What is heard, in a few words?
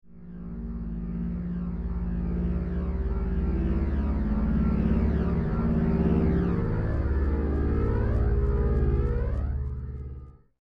Aircraft Spitfire; Airplane Sound Effects